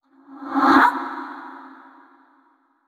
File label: surprised short; yamiko; Aragami